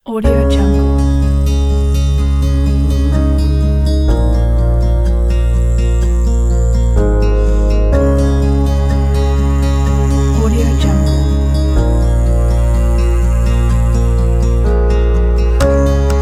inspiring acoustic folk

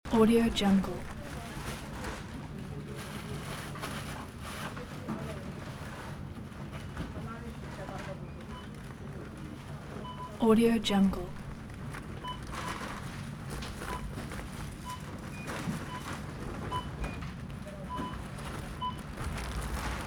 supermarket packing ambience loop

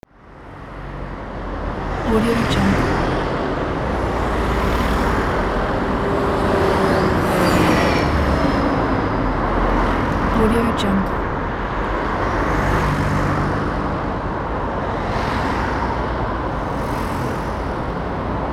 street traffic

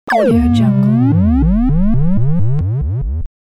retro doom lose

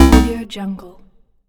failure

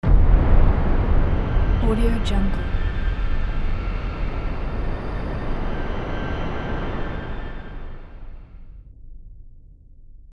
aggressive transition; cleanmindsounds

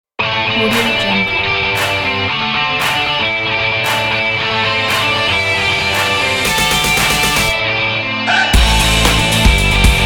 breakbeat indie rock

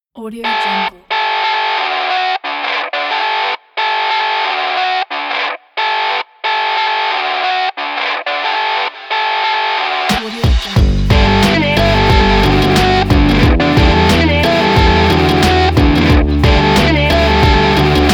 alternative rock intro logo

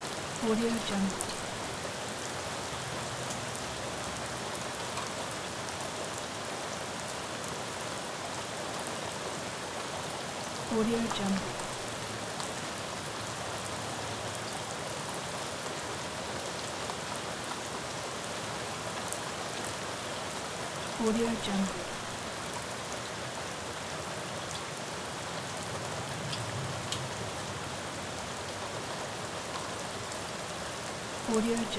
rain sound

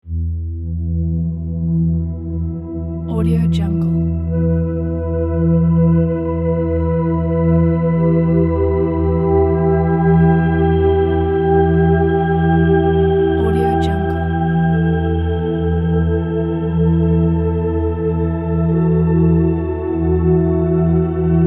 spiritual soundscape pack

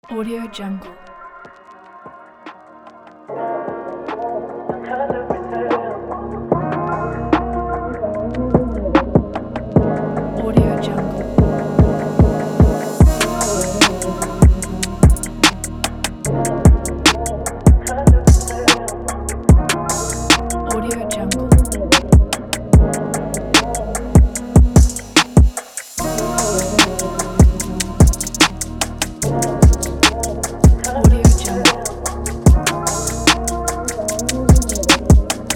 hip hop beat for opener

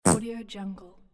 fart

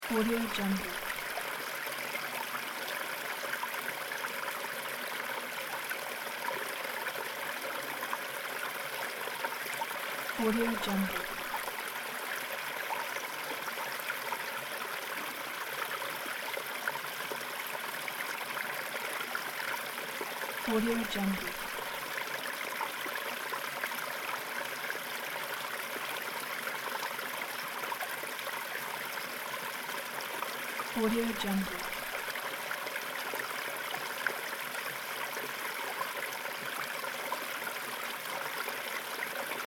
small forest stream

